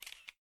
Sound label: camera click; Wiko; Jerry-2